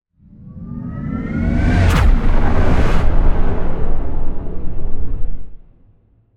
warped; fvtt sonniss gdc audio

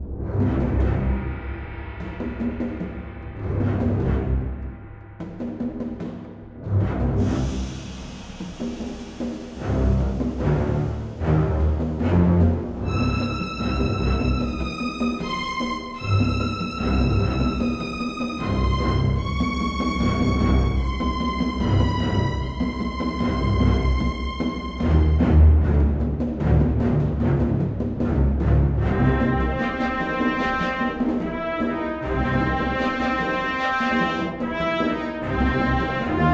loop, music

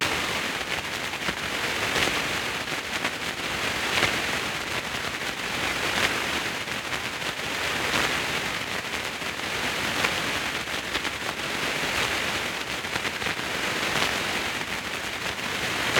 abstract fx; sonniss gdc

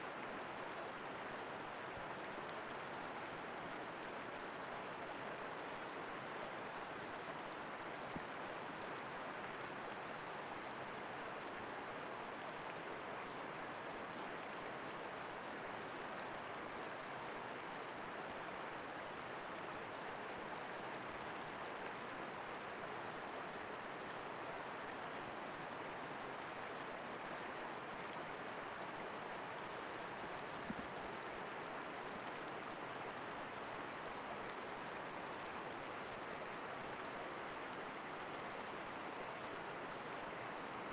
river close shot nature birds no people; sonniss gdc

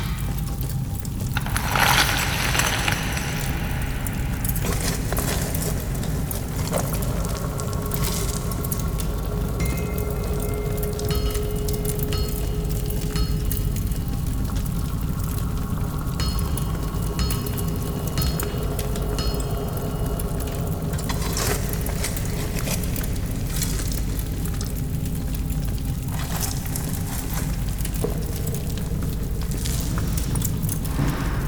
forge temple mysterious, ambiences; michael ghelfi

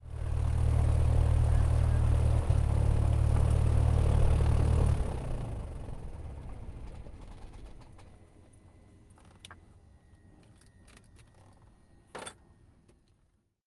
airplane small propeller cessna172 engine off; ambix; sonniss gdc

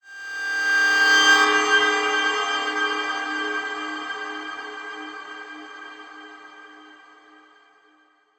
one shot cinematic effect; sonniss gdc

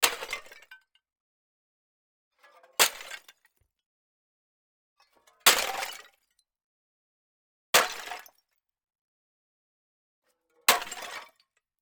roof tile drop several; mono; sonniss gdc

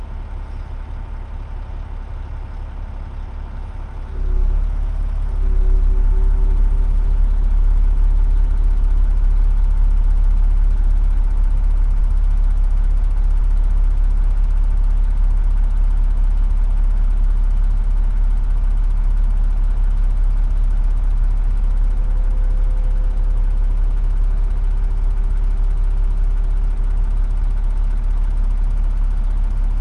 volvo dump truck ext flatbed tilt up and down; schoeps

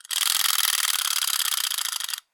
wind up toy; sonniss gdc

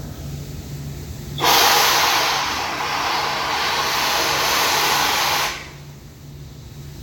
machine burst, machines and steam

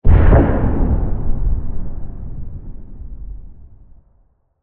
distant blast; sonniss gdc

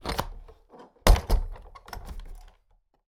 chest ancient close; sfxwiz

